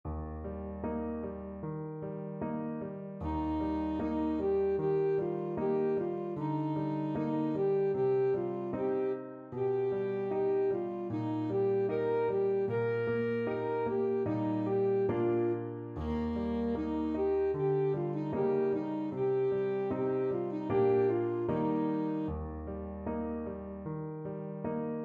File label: ASAX, saxophone